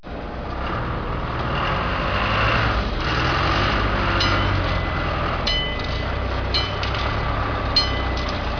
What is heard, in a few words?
vehicle